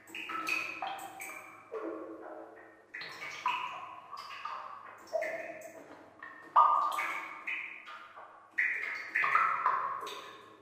Water drip; zps; Ambient; WaterDripping

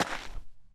gravel, Footsteps; zps; Foley

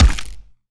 Hit, Zombies, Attack; zps

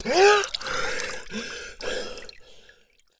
Air, Water, Humans; zps; HM Water

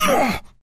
Melee Grunt, Human Male; zps; HumanMale-01; MeleeGrunts

